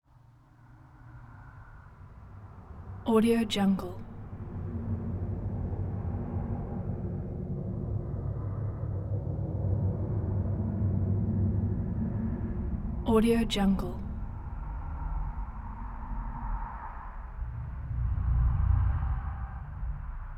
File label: basement roar eerie; prosoundfx